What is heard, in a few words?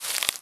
Grass footstep; starbites